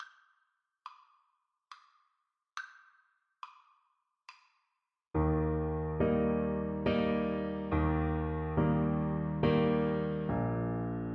daar word, clarinet

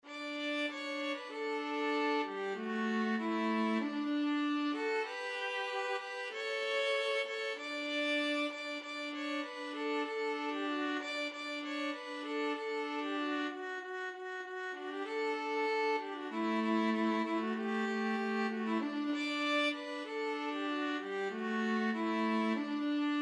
violin viola duet

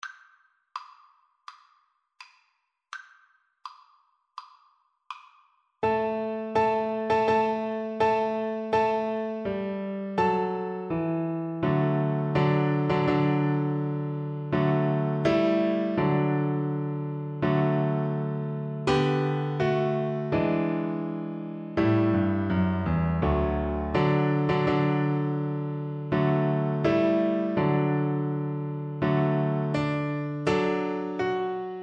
wedding march, Double Bass

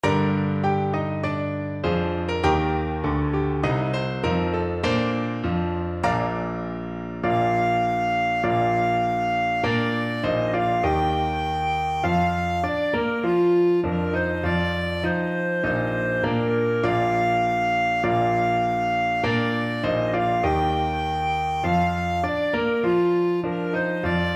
march of the women, clarinet

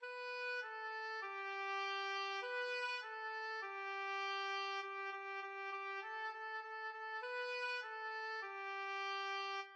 hot cross buns, oboe

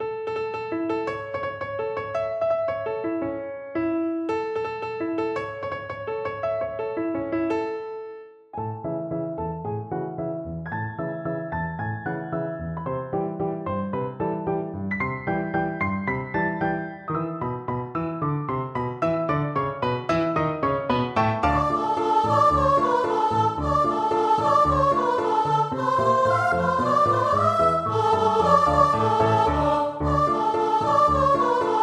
choir; carmen; chorus of children

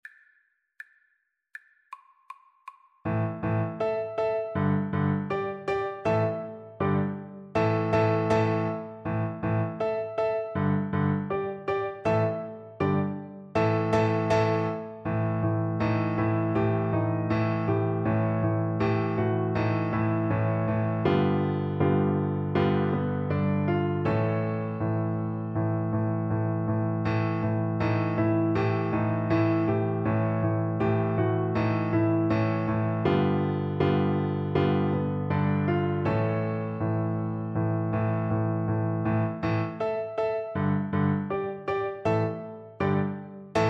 blues violin

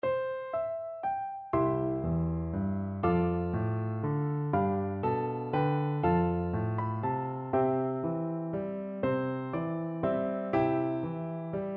morning has broken, piano duet

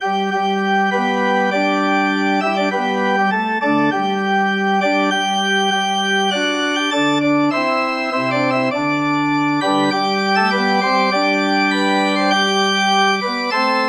hark hark glad, organ

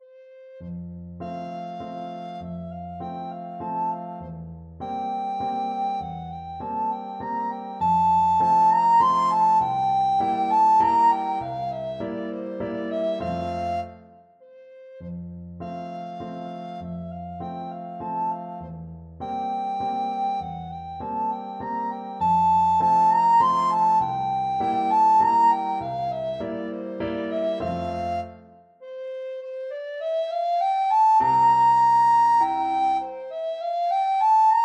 german dance, recorder; beethoven

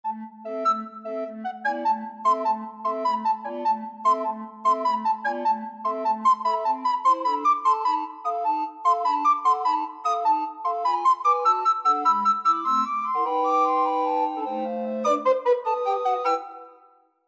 recorder quartet

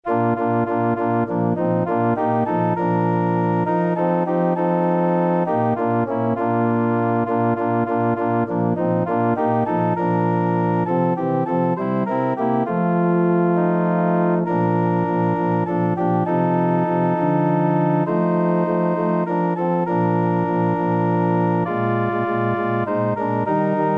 organ

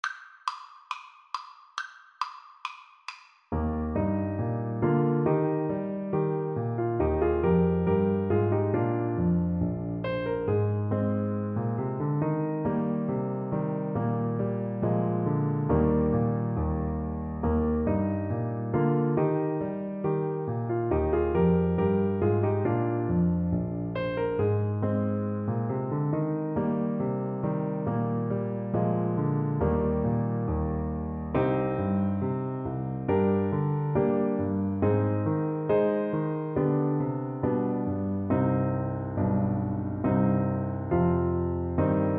trumpet

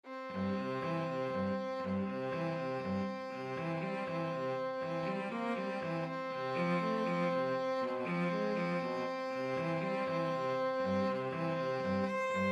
violin cello duet

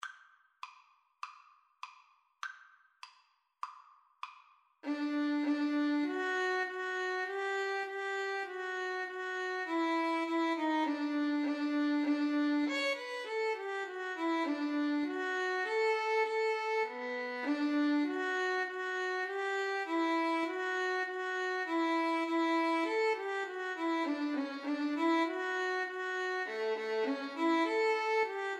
national anthem russia violin

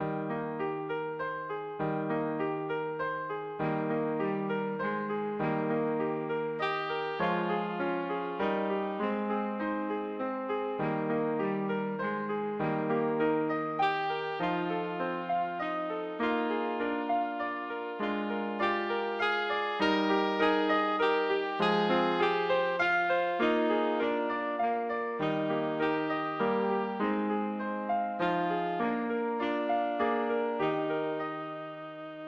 tenor saxophone

